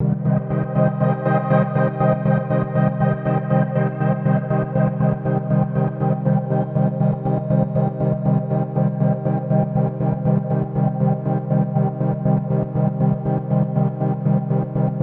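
Choppy Voxscape, sustaining a C minor chord in two octaves; vocal samples; Void & Vista; Kontakt; Highlights/Choppy Voxscape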